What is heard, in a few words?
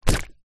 hot water bottle rubber full water set down; household